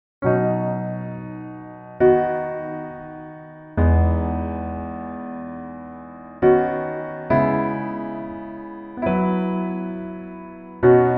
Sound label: Piano ver